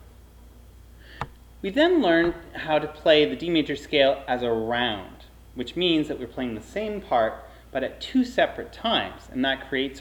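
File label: D Major Scale Round, Strings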